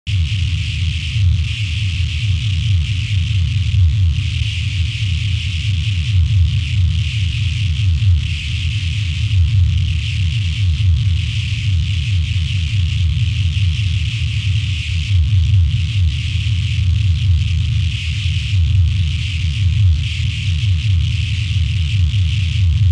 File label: glitched tones Low Frequency Noise Atmosphere